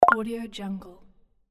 text notification

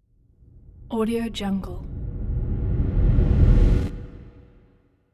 reverse impact stinger

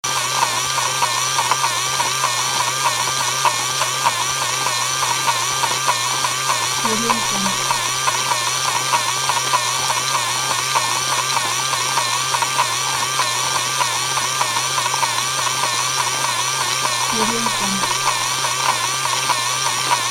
mechanical toy

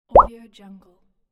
bubble notification

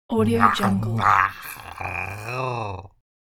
suburban zombie

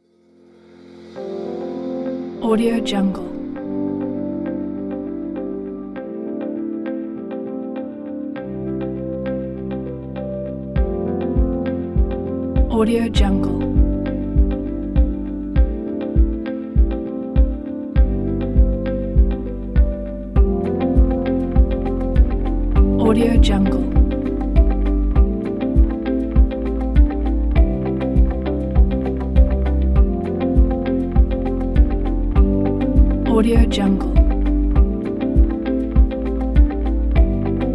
ambient music kit

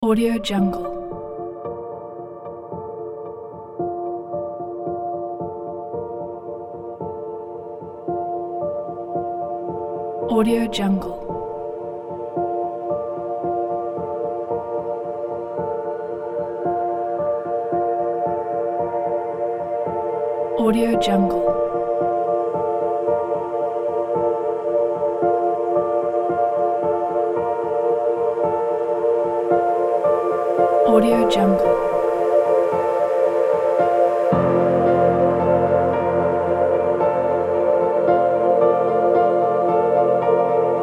cinematic piano soundscape

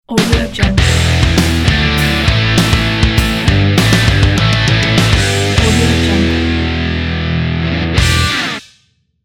rock logo

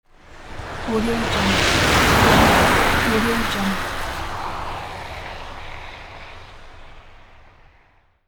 passing car

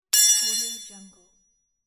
wrench dropped on cement

